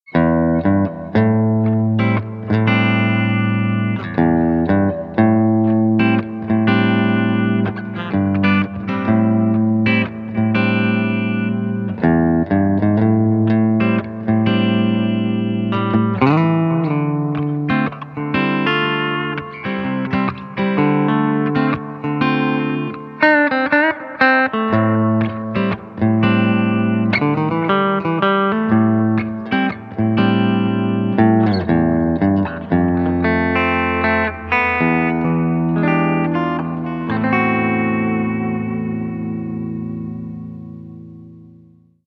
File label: bridge clean, pickups